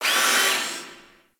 Gas Robot Repair Arm; Warframe; Corpus

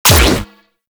Fire; Warframe; Weapons; Acrid

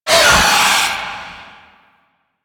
Vocal Pain; Warframe; The New War; Archon; Boreal

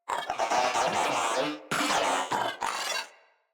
Moa Vocal Idle

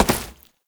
Step Flesh, Foley; Warframe; Oraxia

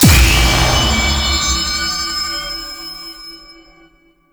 Enemy Spawn; Warframe; Duviri; Dax

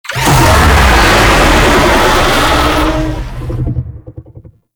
Infested Vocal Death; Warframe

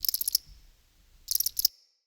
Prairie Day Close Cricket

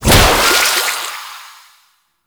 Ink Death Health Orb B; Warframe; Follie

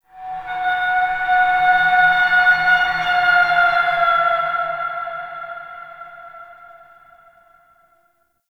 Metal Bend; Ambience; Warframe; TheNewWar; Zariman

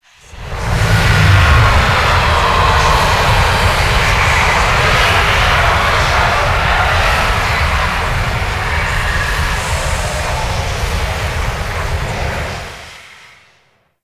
extraction space, vehicles flight; Warframe; Liset; Stalker Liset Skin

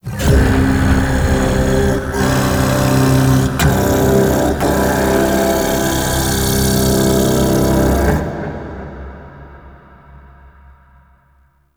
Sand Vocals; Warframe; Man In The Wall

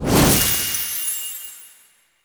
Horse Racing Portal Touch; Warframe; Duviri

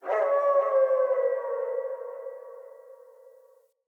Wolf Cub Landscape Howl; Orengal

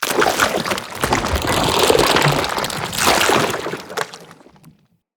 Knight Spawn Riser; Characters; Enemy; Mendicant; Wazzard Knight

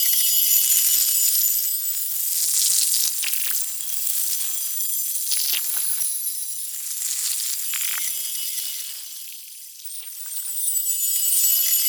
Bioluminescence Loop; Props; Warframe; Tau; Ambience

